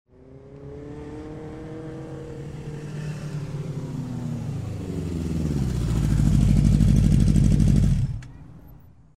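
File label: motocykl podiehal i zagloh, motorcycle drove up and stalled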